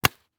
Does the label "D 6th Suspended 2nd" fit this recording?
No